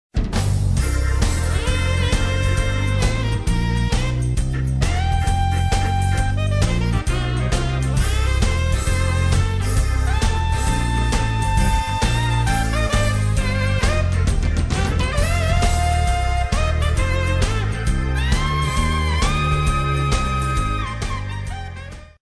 jazz pop

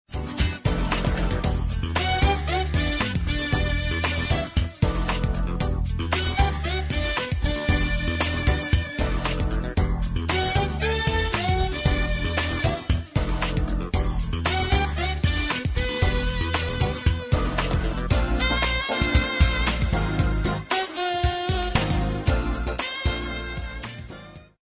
jazz pop

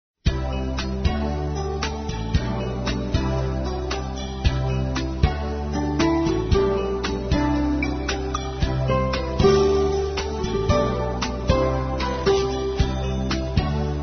jazz smooth

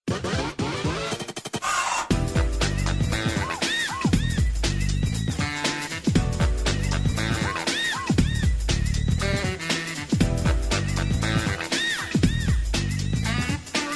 pop dance

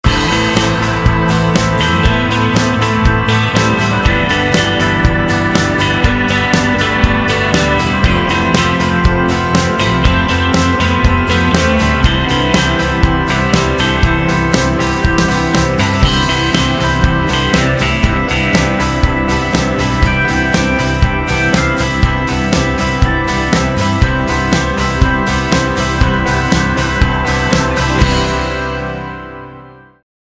Pop Rock

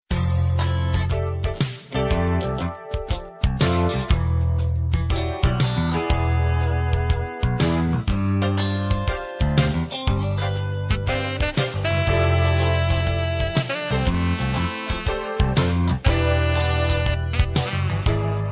jazz funk

